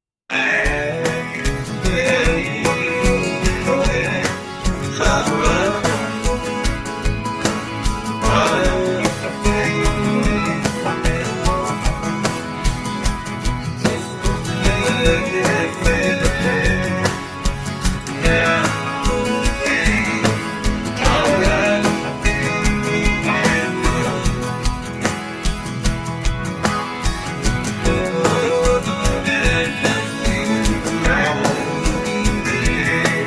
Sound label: country music new age nice rhythm guitar and vocals male 150bpm